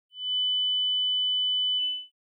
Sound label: Sin 14bit no Interp